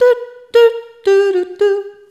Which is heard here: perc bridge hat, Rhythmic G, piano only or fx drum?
Rhythmic G